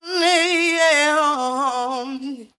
"nnnyeahohohoh" SUNG; ooh ahh

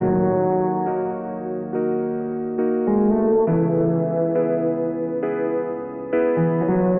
piano and bass, sad loops

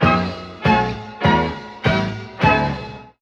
string, stabs

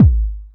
Kicks Electro

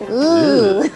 ew; ooh ahh; barney

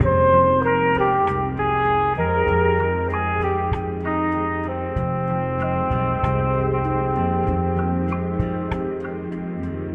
horns unknown

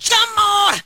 "shamone", SUNG; ooh ahh

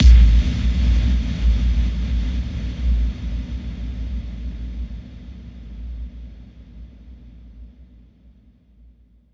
electric explosion; Crash Bang Boom

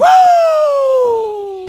woo

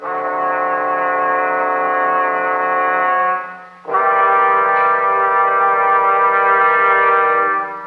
horns, jazz loops

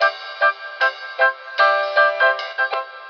flute; Phonky

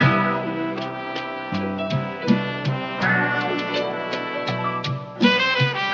horns

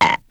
burp short

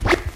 reverse, stabs

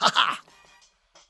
"ha ha"; Voice; ooh ahh

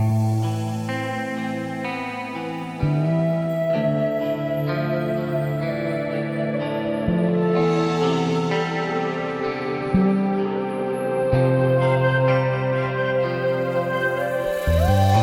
guitar, Sad

